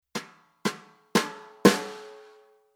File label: Rhythm Country Brush